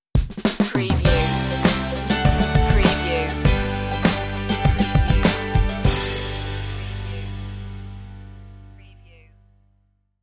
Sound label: family tree stinger